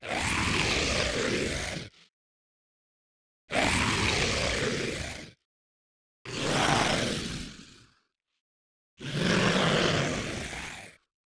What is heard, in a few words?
Gargoyle Flesh Ripper Idle